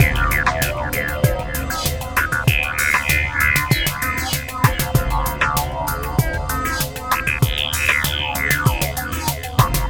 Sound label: Synthetix, Processed Percussion